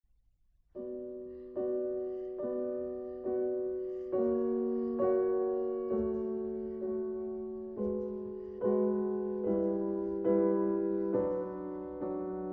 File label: Concerto grosso in D Major Adagio for Piano; Op 6 No 4; Corelli